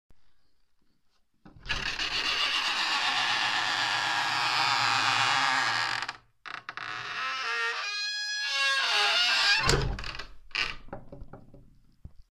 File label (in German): alte tür mittelalter